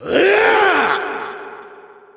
mouth beam

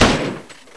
m4 assault rifle firing

